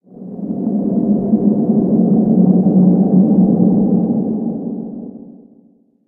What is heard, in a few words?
dark wind; ambient; outdoor